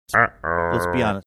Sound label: mimicking uh oh drop